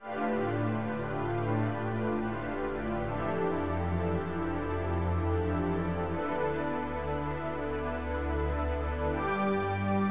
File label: Symphony in C major; Symphony No. 22